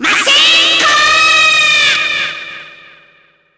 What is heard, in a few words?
power beam